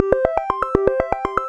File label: Vibe Win Transfer; JackpotDarts; ClubFusion